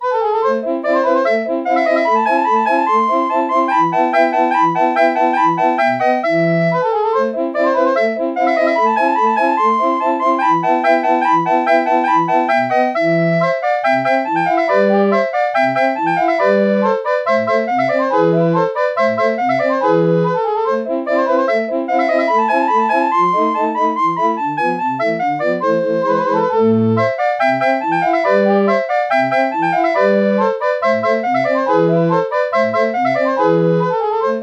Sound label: recorder